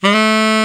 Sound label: TENOR SAX A; Northstar - New Gold VOL-1